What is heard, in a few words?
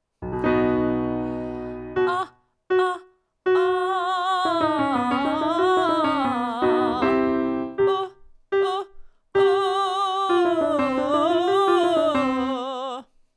Soft Glottal Stops; Chest Voice SOFT glottal stops on “uh” or “ə”